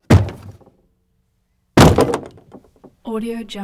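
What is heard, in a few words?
wood drags wood window frame drag drop hit rattle; بسته شدن قاب پنجره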